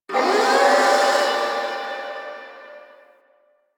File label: Sentient Devourer Ship Alarm; Warframe; The New War